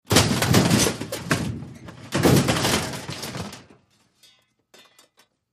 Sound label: Junk Slams Metallic